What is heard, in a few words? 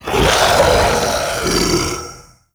Male Vocal Breath Heavy; Enemy; Mockeries; Sub Boss; Mockery